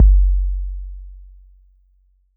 808 K d, Roland MV-8008; Roland MV8800

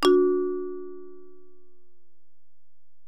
Bell, Alesis DM-PRO

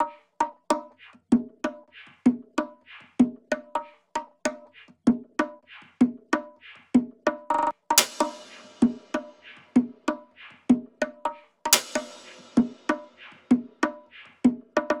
loop perc 128; Seismic Loops

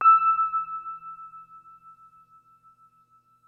WURLI E-PIANO; Kid Nepro - Elite

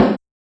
Kit Snare, Realistic Concertmate-670; One Shot Kit

